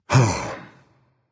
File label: breath berserker; cuchulainn